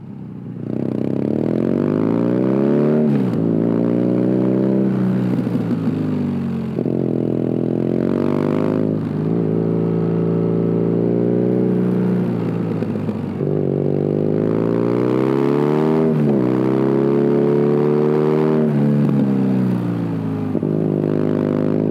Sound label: R nineT Scrambler Original sound, exhaust; BMW; euro-4